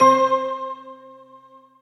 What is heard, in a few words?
Bellway; Ableton; Retro Synths; P-50 Linear; P-50 Structures